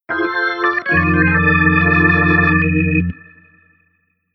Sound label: organ